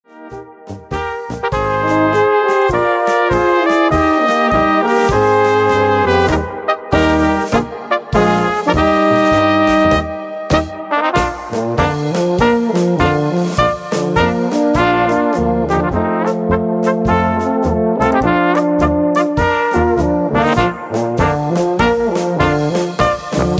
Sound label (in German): Dorfmusik